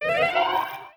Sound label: ui notification leader death; Stellaris